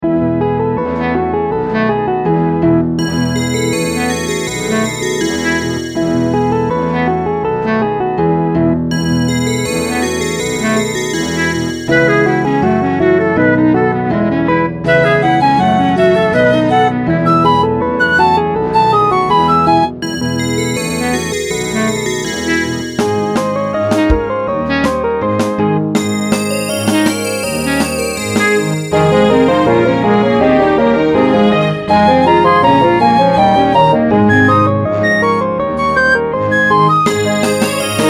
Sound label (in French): Bourree